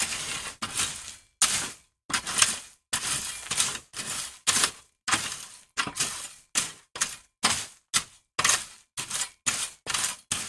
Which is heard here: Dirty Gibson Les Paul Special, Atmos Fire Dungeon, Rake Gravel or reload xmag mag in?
Rake Gravel